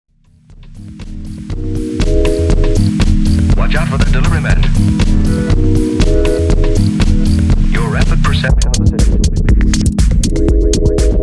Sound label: house